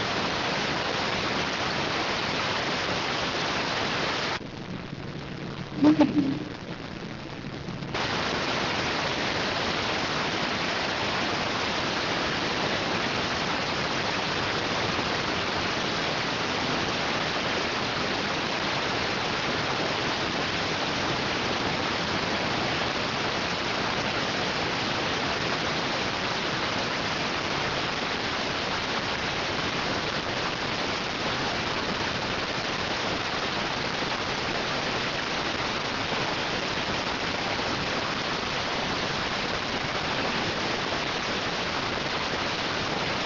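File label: radioteletype receive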